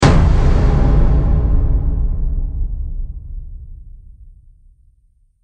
Explosion Impact Tunnel, bomb

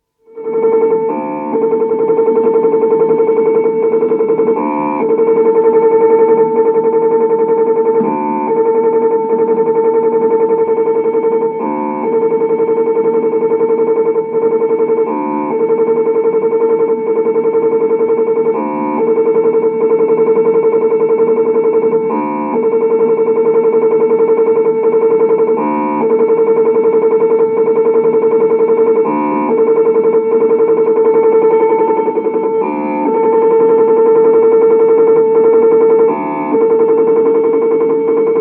Electronic Signals, Computer atmosphere; Computer Sound Effects; BBC

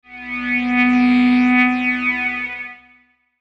Logo Hum Swell; Sonic Logo Sound Effects; Production Element Imaging Element Accent Transition

